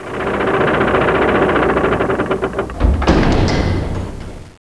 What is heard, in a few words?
door down; Renaissance